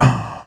VOX; USB Soundscan vol.32; Roots Disco; AKAI

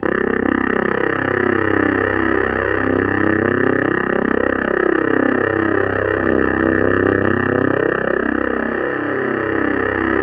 C1, Synth Leads

